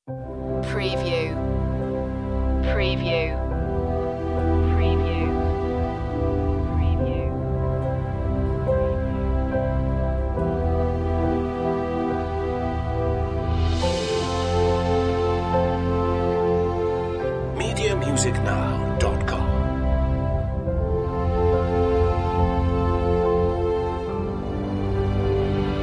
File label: no choir version